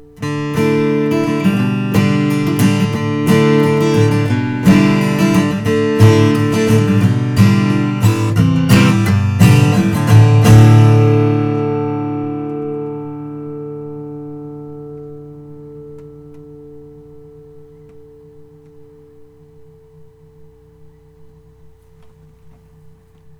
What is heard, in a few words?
Froggy Bottom H 12 Deluxe koa; natural